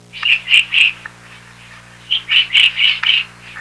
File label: woodpecker sounds